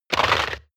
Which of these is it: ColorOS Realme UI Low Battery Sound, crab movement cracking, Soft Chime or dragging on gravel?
crab movement cracking